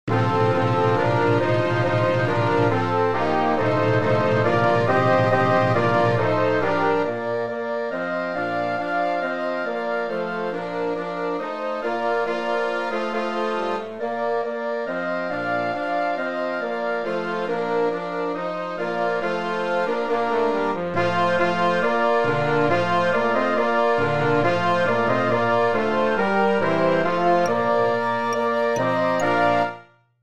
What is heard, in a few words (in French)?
Hymne Euro harmonie; midi